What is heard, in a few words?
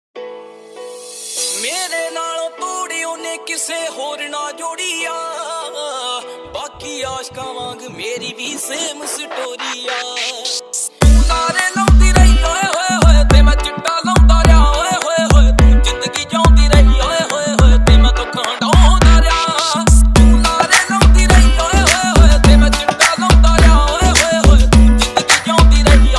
Dj Remix Punjabi Song